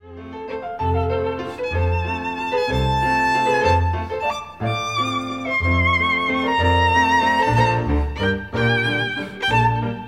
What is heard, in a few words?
Waltz; Strauss Waltz Tale Of The Vienna Woods; dances with strings